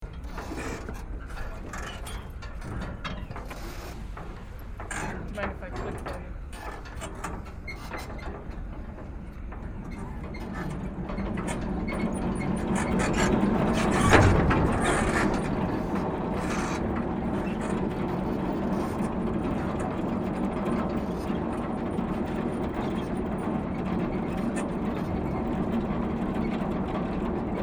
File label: cablecar